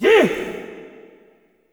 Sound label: male cry, Africa; Spectrasonics; Heart of Africa 2; S.MALEAMB